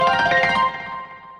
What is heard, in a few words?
pause sfx; touhou